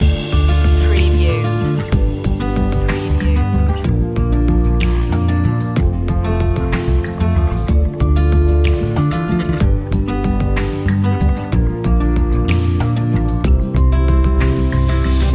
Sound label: kids playing loop